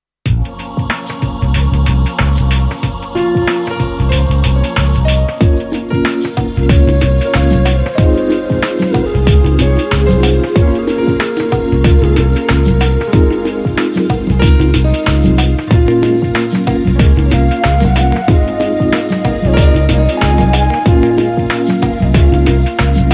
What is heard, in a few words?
PIANO DELAVE